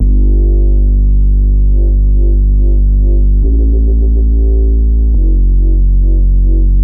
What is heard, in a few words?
Bassline; Ghosthack